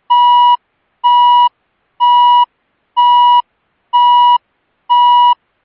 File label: Euro General Intermittent Horn 969Hz